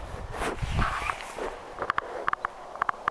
mika laji hemppoparvesta kuului jip-aania

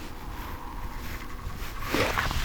onko tuo joku kurppalinnun aani juuri nousi lahelta jankakurppa

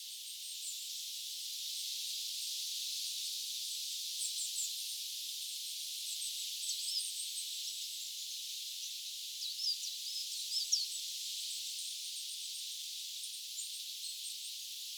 kuusitiaisen aania

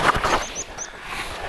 tuollainen niittykirvinen

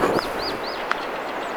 tuollainen tiaisen aantely

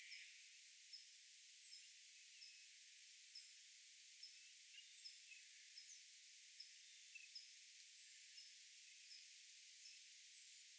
onkohan niittymetsakirvisen huomioaanta siina pesimapaikassa lintutornin lahella

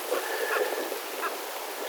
korpin kolme erikoista aanta; korppi kotkottaa?

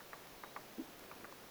kuusitiaisen vahan taigauunilintumainen aani

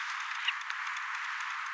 kurpan ääni

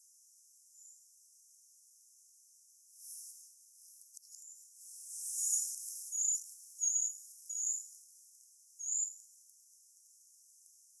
puukiipijan aantelya

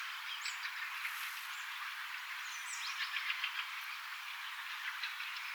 onko jokin sinitiaislintu

